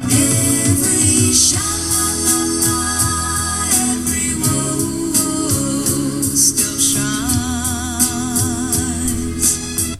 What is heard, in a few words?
Song